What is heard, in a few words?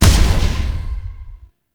Hand Projectile Die, Weapons; Warframe; Duviri